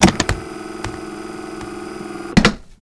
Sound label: launch switch open; hl2 ep2; ambient, levels, outland